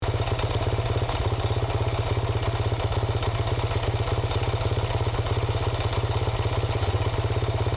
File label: Scooter onboard idling engine loop